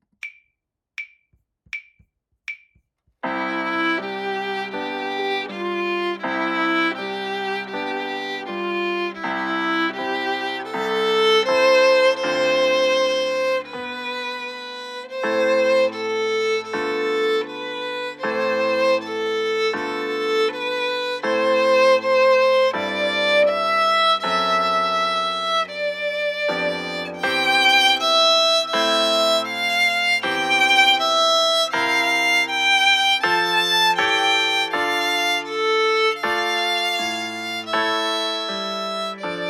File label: Etude with violin; The Violin Fun Book (Level 2)